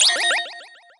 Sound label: Boom Out; MilkChoco